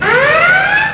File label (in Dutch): sirene; startrek